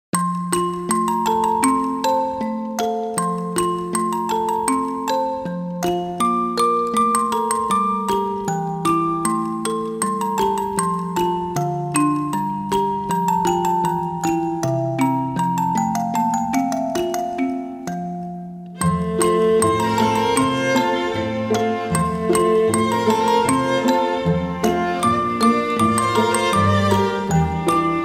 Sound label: mozart piano piece in f major